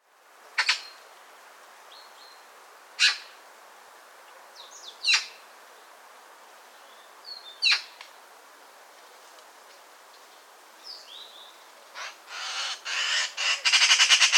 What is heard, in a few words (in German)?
Elster Rufe